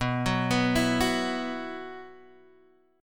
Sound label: B Suspended 4th chord; Bsus4 arpeggiated; JGuitar